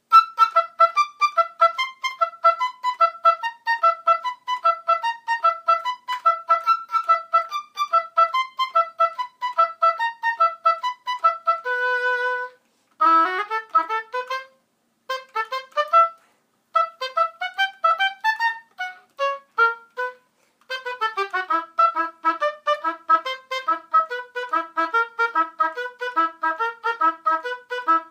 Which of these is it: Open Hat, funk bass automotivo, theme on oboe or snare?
theme on oboe